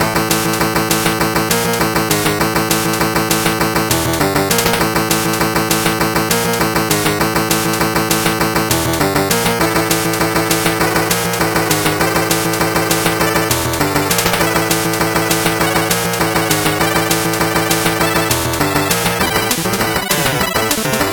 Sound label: 8bit bgm fast